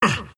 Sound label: grunts; Wraith King